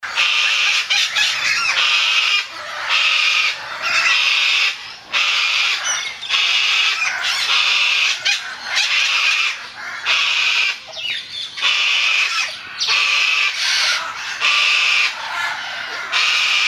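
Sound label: Umbrella Cockatoo, parrot calls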